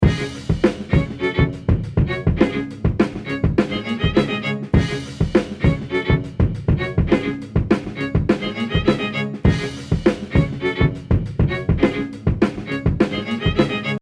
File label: Sting; Ramble